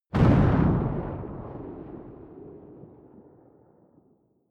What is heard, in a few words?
missile small explode distant